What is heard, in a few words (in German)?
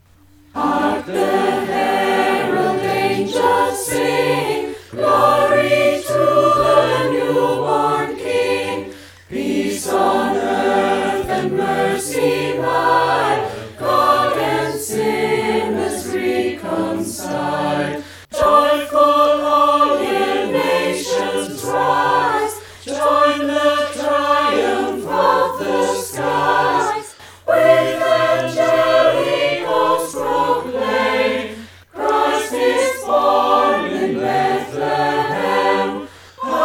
Chor Weihnachtsgrusss